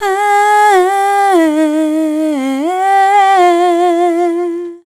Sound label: Dance Vocals, FEMALE